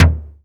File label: Fricke MFB712 TOM